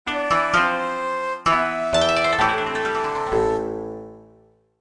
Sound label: Japan Music Short; Civilization Revolution